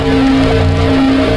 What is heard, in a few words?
fireball; Far Gate